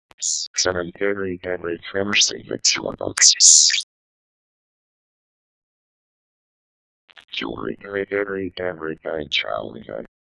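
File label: chat message sent and received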